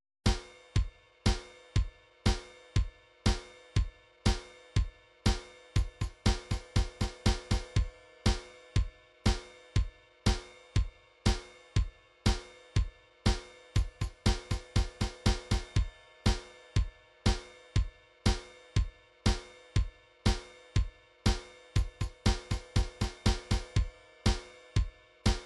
drums, midi drums

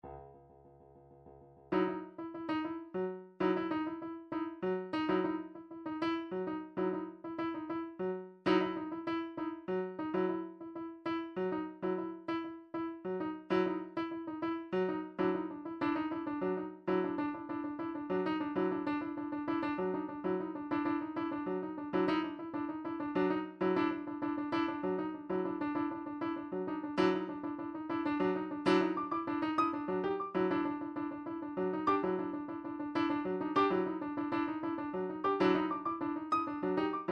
odd meters; Drumtrax Library 2

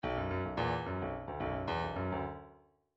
bdf midi file grooves funk q funk q

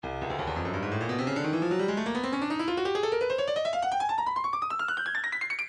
breakbeat midi 170 bpm; Stylus

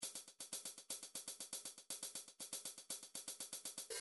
funk cymbals 2 bar, midi

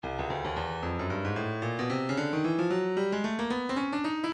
breakbeat midi, 112 bpm; Bobb B; Stylus Breakbeat Midi Files

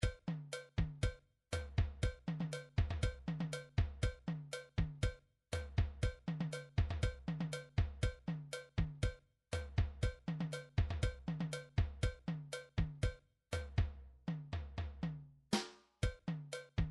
drums chacha

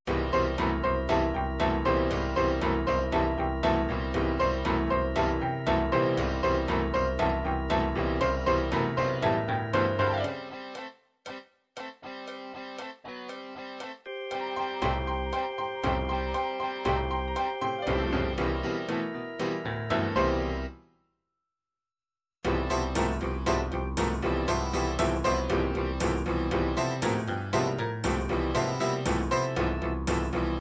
country pop

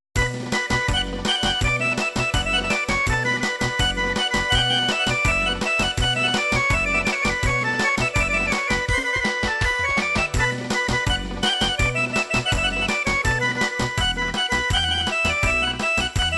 folk srbije kiuchek fast

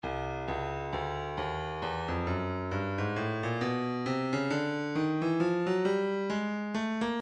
breakbeat midi 067 bpm; 065 069 Bpm; Crenshaw C; Stylus Breakbeat Midi Files

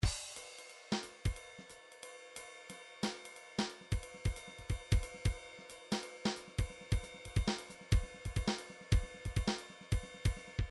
drums 4 4 swing

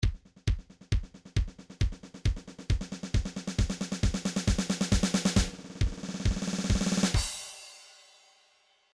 trance midi loops fills; Newtronic Trance Constuction Kit; long bild

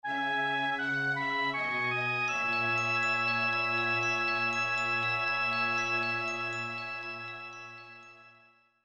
Super Nintendo game over, midi; Terranigma; Setzer

